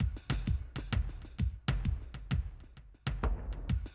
130, Classic DrumLoops

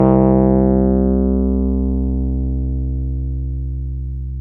Wurly, Keyboards of The 60's and 70's

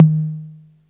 tom, Yamaha RY-8

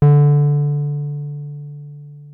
303 C4, TB 303; Hollywood Edge; Giorgio Moroder Rare Synthesizer Collection

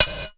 BG RIM, METALIC DRM; Crypton - DrumBase5000; METALIC DRM2

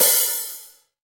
HH, Kits, Rev

